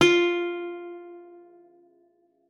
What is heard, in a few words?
F4, GUITAR; Xsample VOL-2